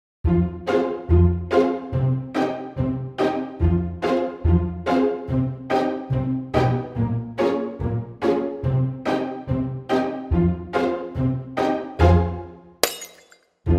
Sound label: karaoke version